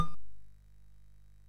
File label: sid, MOS6581R2; hvsc; War Cars Construction Kit